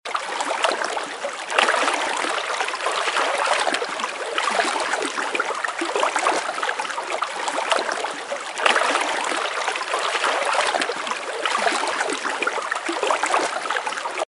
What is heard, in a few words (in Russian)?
zvuk burlenija v protochnoj vode